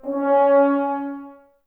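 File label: Brass French Horns C#; Sample CDs; Miroslav Vitous; Woodwind Brass Ensemble; F.Horns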